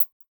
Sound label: Percussive, UI SFX